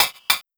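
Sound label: hi-hat, Kit; Sonic Foundry (Sony Creative Software); Esoterik Beatz; Bello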